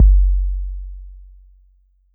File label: 808 K; Roland MV8800